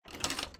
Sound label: door open; doors